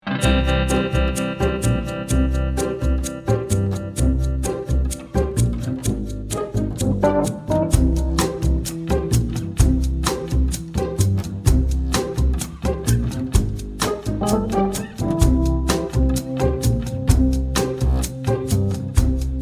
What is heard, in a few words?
Shake groove